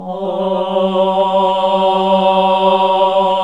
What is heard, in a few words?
HAL AAH, Nuns Aahhh